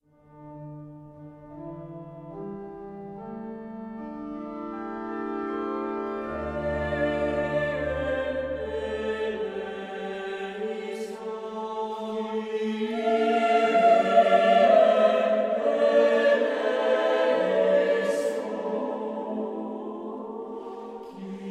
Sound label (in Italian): voci